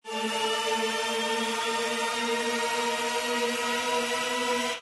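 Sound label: respin Sound; jackhammer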